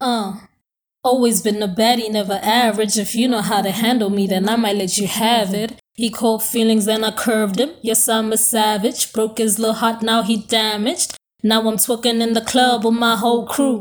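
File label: hip hop 86 B minor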